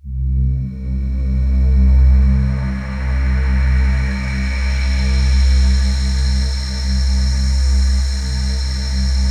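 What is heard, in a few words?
PAD, atmospheric pads; Best Service; XX Large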